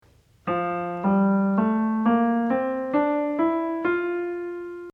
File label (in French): gamme de fa majeur